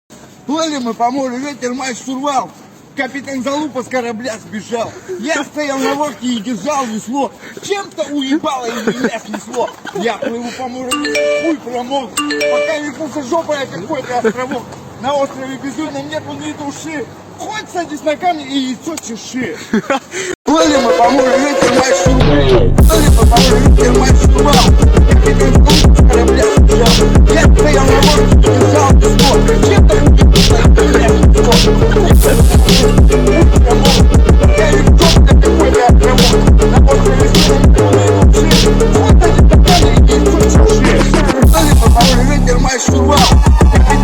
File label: phonk remix